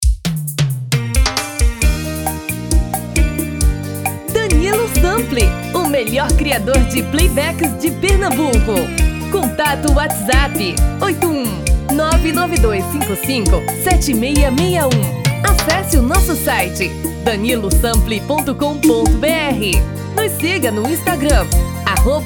tom feminino

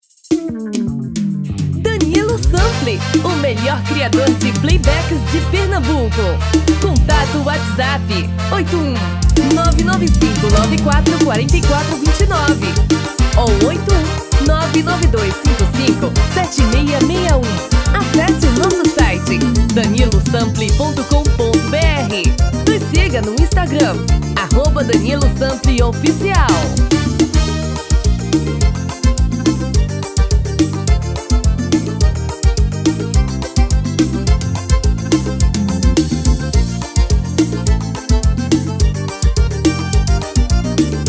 Forro das Antigas

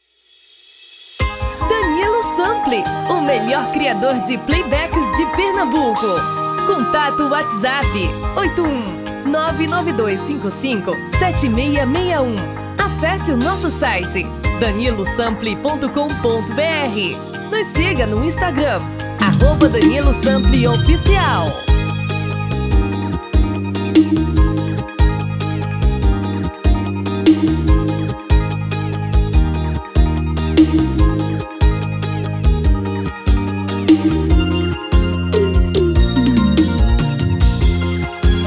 Brega feminino

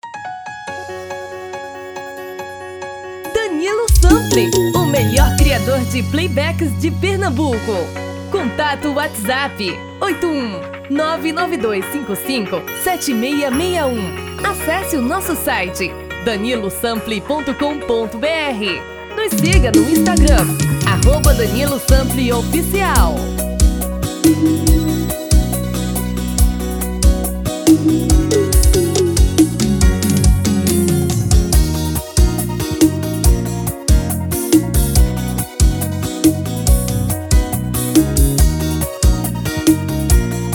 Brega

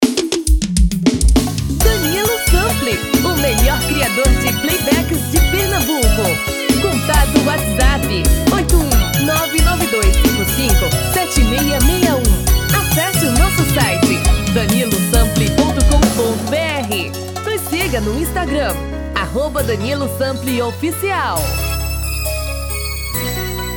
Brega

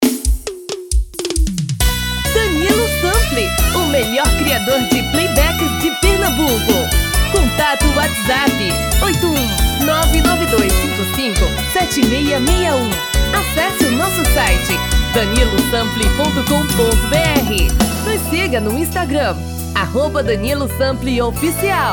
Brega

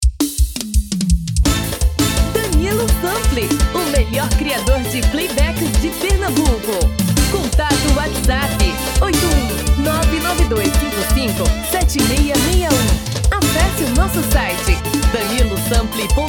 ELETRICO

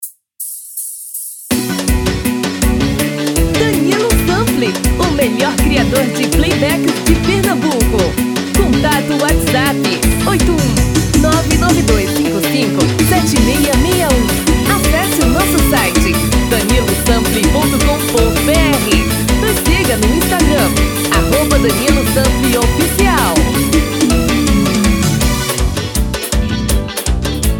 Eletrico

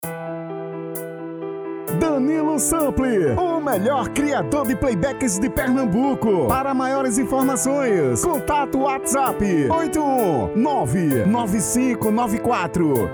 versao forro

